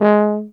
BARITON; Best Service; Alpine Volksmusik

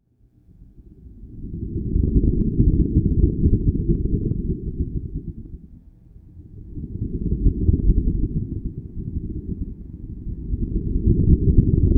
THUNDER; Sonic Foundry (Sony Creative Software); World Pop

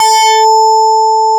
MARIMBA

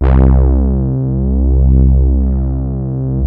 OBX; Kid Nepro - Elite

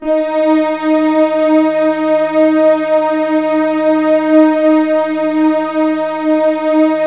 D#4, Keypad; Standard Instr; Keypad 1

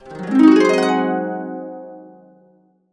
Complete Harps; USB Soundscan vol.62; AKAI

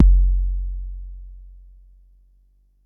Bassdrum, Jomox Xbase09

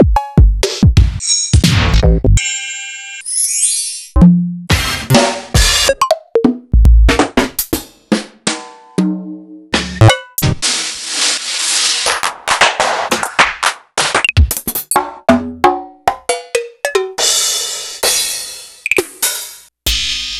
EMU

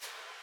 snare, Millenium MPS-400

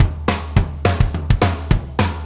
Tamla, Drum Tools Various Loops; Sonic Foundry (Sony Creative Software); Loops & Samples Drum Tools

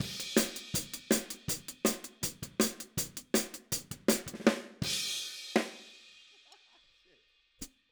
Outro Hi Hat, 162 BPM, Drums; Drums from the Big Room The Mixes; Take Your Toms Off

